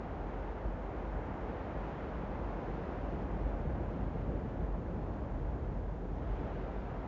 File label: desert light wind gusts; ambient; atmosphere